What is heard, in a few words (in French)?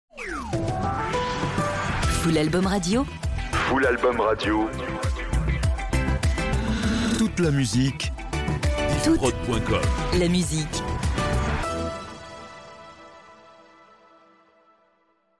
Radio Sweep Toute la musique